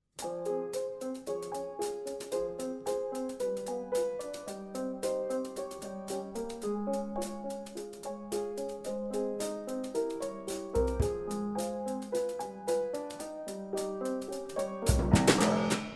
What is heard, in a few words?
Drum Piano